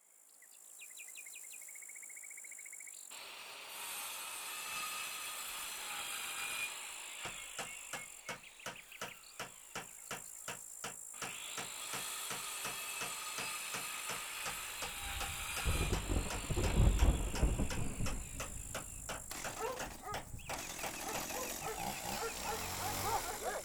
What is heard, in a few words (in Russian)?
zvuki stroiki i remonta